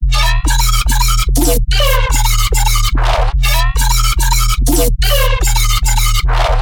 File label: Weirdo Loop, Bass Loops, 145, E; Virtual Riot